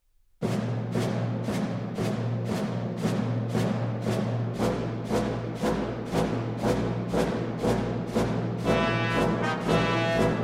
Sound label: Brass; Gadfly Suite